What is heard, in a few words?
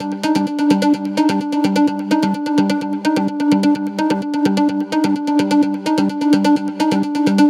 Melodic Loop Frozen Gmin 128, Melodic Techno; Ghosthack; AC2024 - Day 12 - Melodic Techno